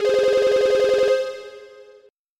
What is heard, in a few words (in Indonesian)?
Nada Dering Nokia Jadul Desk phone